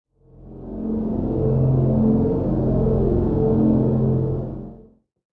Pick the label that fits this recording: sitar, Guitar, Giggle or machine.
machine